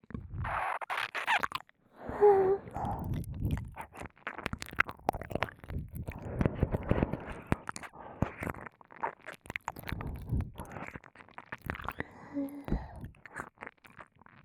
耳舐めループ, ASMR; 生意気メイドに手玉に取られるお射精性活からの凶悪お〇んちんでわからせっ! 一粒で二度美味しい♪メイドさんが性処理してくれる性活。

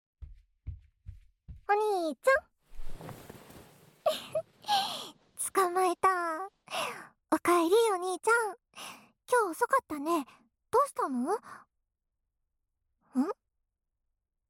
耳舐め; 妹からのお仕置きは; 妹と彼女の射精管理

